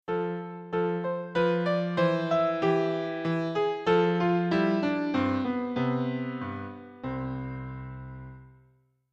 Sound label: minuet